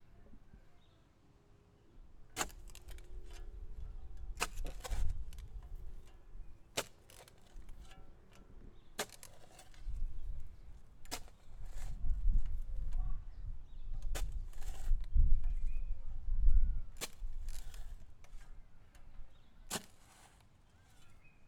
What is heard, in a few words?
Shovel Digging